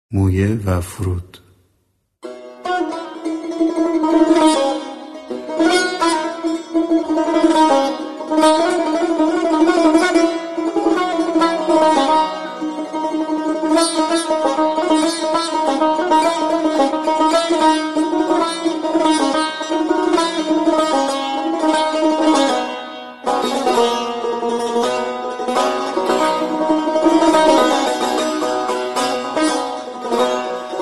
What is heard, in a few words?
Chahargah